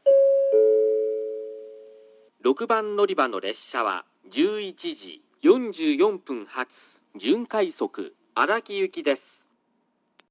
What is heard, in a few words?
kokura semirapid araki, portable recording